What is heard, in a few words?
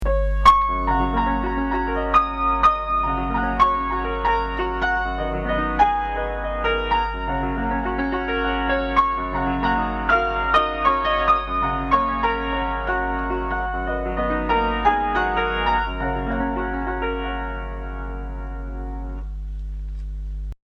spring, piano